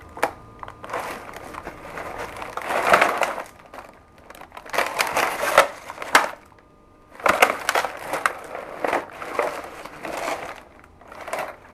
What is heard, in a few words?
indoor